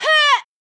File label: combo, onehand sword; warrior